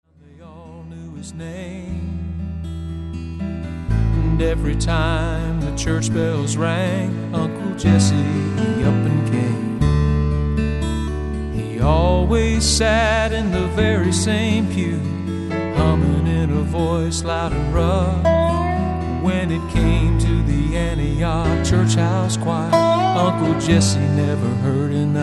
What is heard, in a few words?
church choir